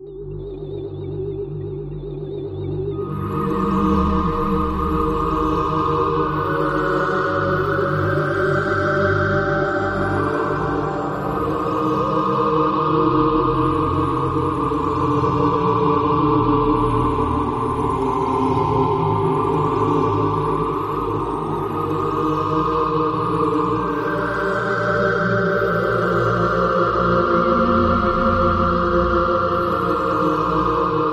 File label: spatiotemporal atmos